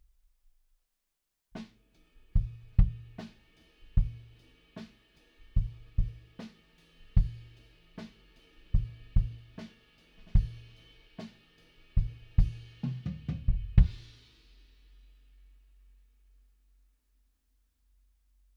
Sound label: kick in